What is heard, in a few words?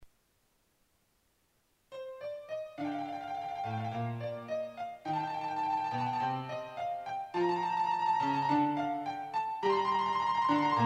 sax; sax malices